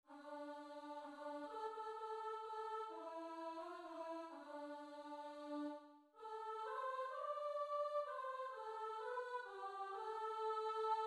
scarborough fair alto

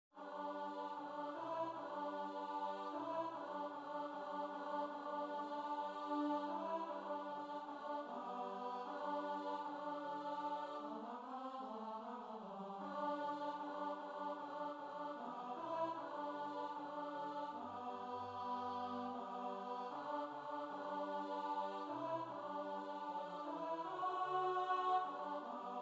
altos

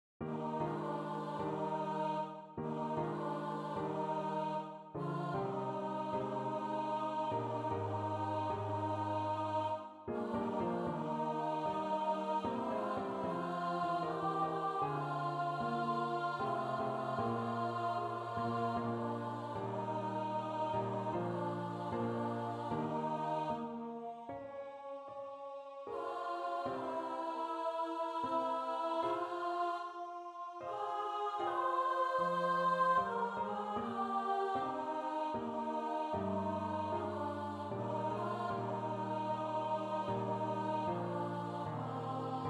altos; as torrents in summer

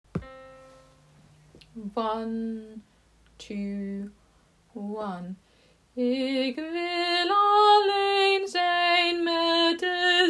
dutch song alto

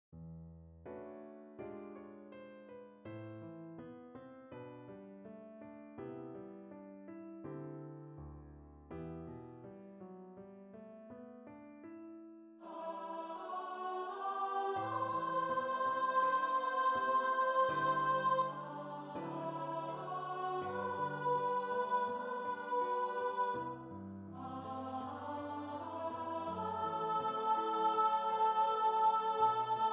sops

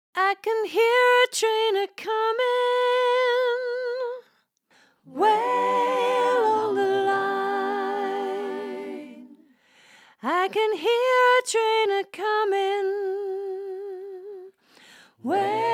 soprano learning track

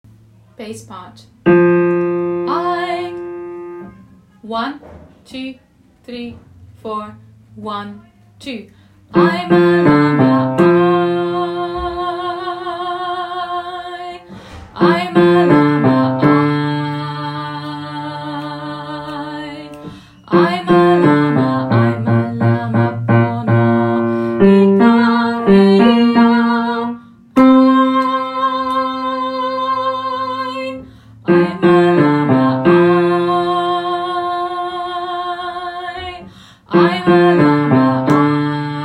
bass only

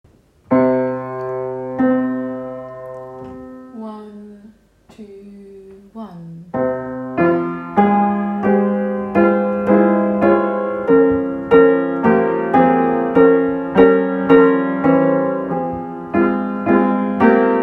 dutch song piano acc

